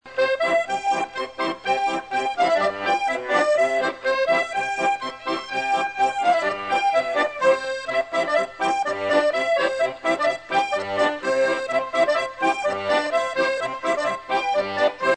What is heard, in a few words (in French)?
maraichine